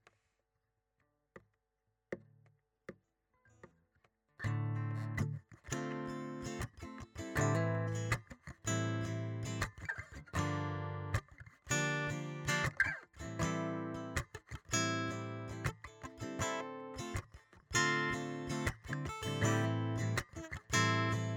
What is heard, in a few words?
Dm7 Am7 Gm7 A Dom7 FM7 Dm7